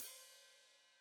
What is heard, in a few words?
Hat Foot Open, Hi-Hat; Cymbals Stereo; Deeply Sampled Drums